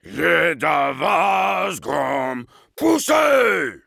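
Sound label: singing, French; Heavy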